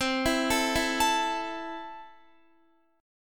C 6th C down Guitar; arpeggiated

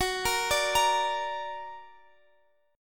F sharp Augmented F sharp Guitar Standard; x,x,16,15,15,18; arpeggiated; capo=2

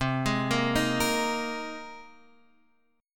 C Minor 7th Sharp 5th C, chord; Cm7#5 arpeggiated; JGuitar

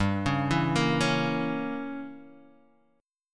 E Diminished 7th G

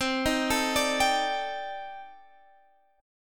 C Minor 6th Add 9th, C, Guitar, Standard; arpeggiated; JGuitar

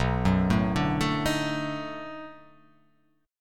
B 7th Sharp 9th, down

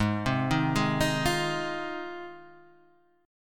G sharp 6th Guitar, chord; arpeggiated; Standard; JGuitar

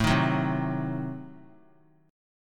A Diminished 7th A down; Adim7 strummed; JGuitar

